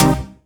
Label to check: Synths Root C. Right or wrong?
Right